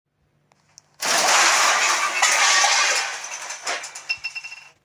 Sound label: wardrobe crash